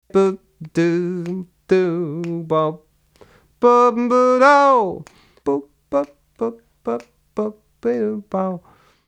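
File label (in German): Male Vocal 1 x komprimiert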